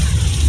flame thrower

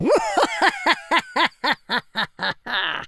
laugh, Super Villain; speech

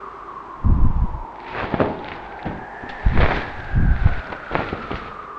Parachute

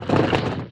Cow Walk; Worms3D

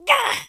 oof; speech

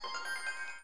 Click

